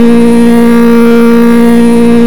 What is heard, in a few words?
on high ex, Engine; rTec Engine